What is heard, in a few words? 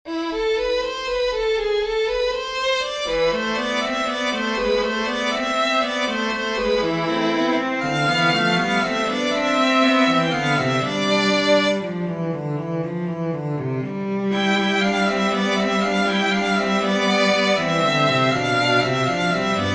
terzetto delle campane for string trio; martini giovanni battista